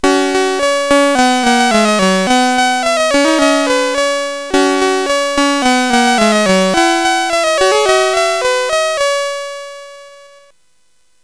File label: Revenge of Mutant Camels sid CSG8580R5